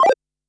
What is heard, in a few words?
Notifications and Buttons